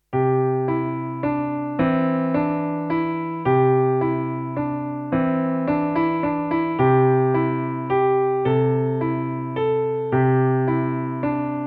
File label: theme piano intro; morning mood; edvard grieg